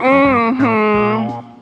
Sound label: mmm hmm, voice; ooh ahh